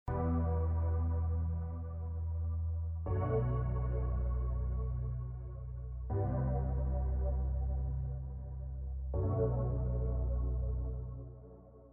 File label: music